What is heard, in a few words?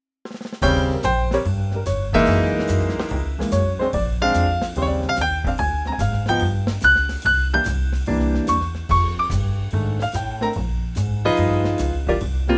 Bb trio